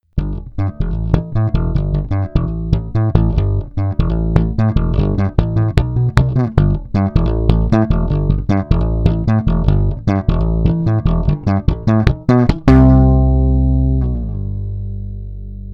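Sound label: Thomastik, bass; La bella; Rotosound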